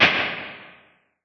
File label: Amp Spring, Impulse Responses, Spring Reverbs; Small Spaces; Apple